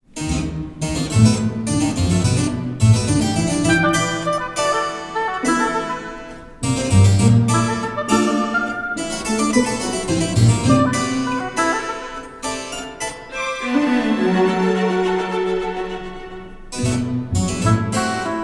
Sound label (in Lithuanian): Koncertas obojui, klavesinui ir styginiams